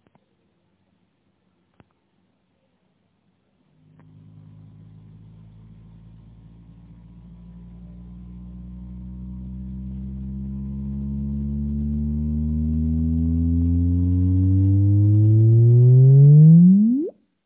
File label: pulsar sound